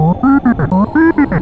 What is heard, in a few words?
Open